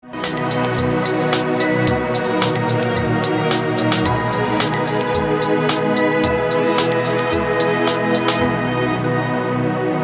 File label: Church Melody; church melodies volume 1